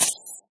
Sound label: creeper; mob; Minecraft